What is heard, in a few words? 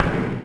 foot; Tony Hawk's Project 8; XBOX; shops